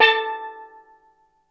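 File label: steel drum A3, tonal percs; Crypton - DrumBase5000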